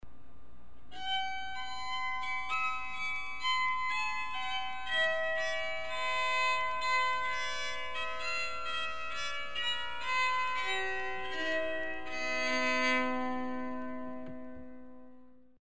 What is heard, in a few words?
bowed psaltery